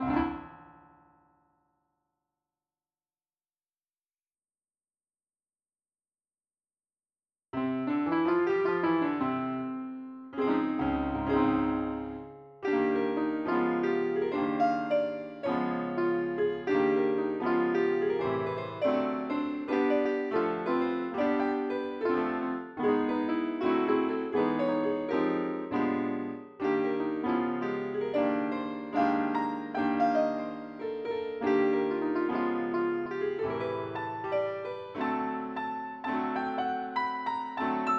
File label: piano